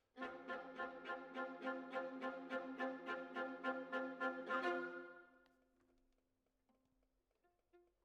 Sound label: Violas, jazz for piano